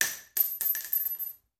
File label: Bullet Shell; Sound Ideas; Guns